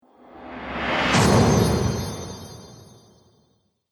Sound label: Trailer Magic Whoosh Sparkle